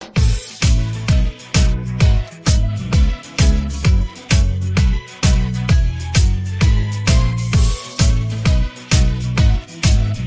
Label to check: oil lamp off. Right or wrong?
Wrong